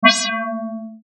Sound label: Beep Tone; Multimedia Web Interactive; Beeps (Sound Effects:Multimedia)